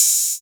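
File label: TR808 open hi-hat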